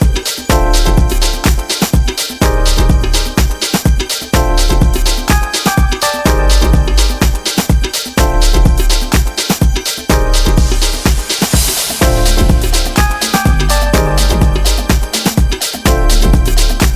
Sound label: Instrumental